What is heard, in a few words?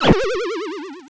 death; opensurge